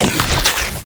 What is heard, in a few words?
Flying Mouth Attack Inhale End; Warframe; Man In The Wall